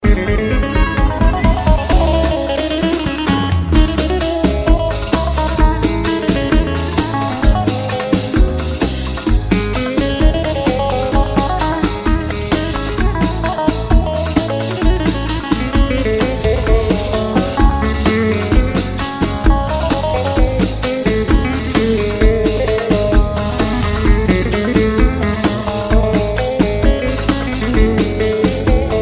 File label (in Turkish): baglama